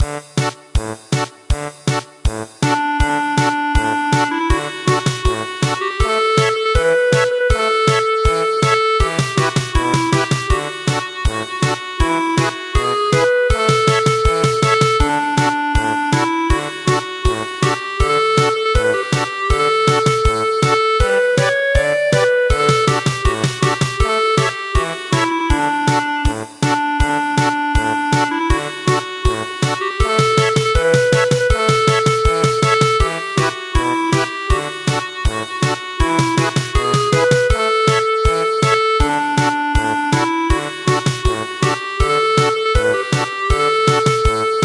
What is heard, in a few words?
German Polka